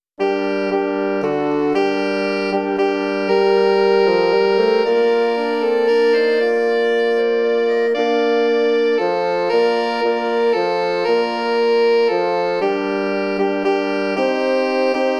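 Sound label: Cantate Domino, G minor, ALL Instrumental; Pitoni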